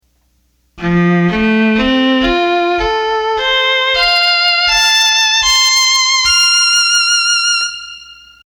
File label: Violin, accordion